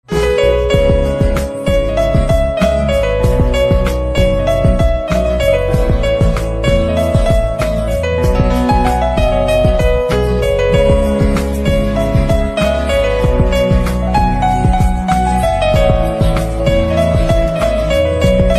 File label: Piano Instrumental Ringtone